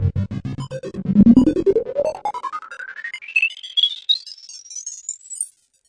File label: spacey control room; Computery or Science Fiction